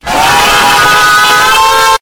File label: aaugh